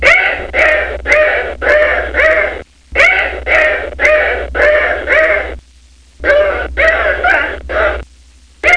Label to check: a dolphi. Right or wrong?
Right